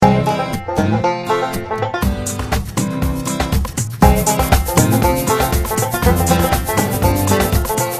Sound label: Morning sunshine, Ringtones; BenQ; B502